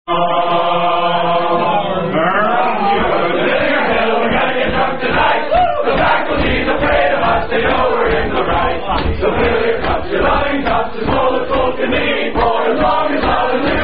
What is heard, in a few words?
Bawdy College Drinking Song Medley; RUGBY ROAD!!; Unusual UVA Bawdy College Drinking Song Medley